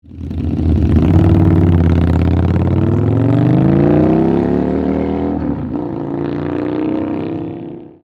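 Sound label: TAKEOFF, motorcycle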